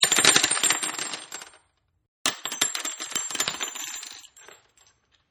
Handgun Shelldrop Multi, Multiple Handgun Bullet Shells Drops And Bouces On Tile Floor; Impact Sound Effects